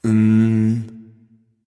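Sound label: non-verbal tongue; smite; voicepack; houyi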